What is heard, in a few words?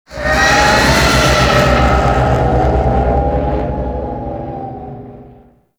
fly by quick, vehicles; Warframe; Liset; Liset Flight; Nora Liset